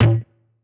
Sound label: guitar electronic, distortion guitar